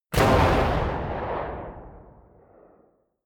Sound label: missile large fire helicopter distant